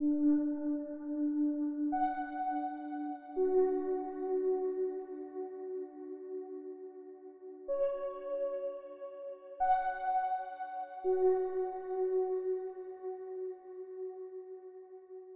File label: 125 BPM F# Min Synth; Loop Stems; Cymatics; Only You